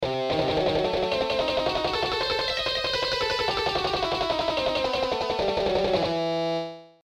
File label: Shredding Exercise